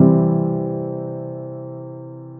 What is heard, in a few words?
chord C